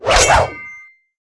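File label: Soldier Attack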